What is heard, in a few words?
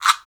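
perc afro, gourd guiro; Roland LCDP14 Africa VOL-2; Afro Toys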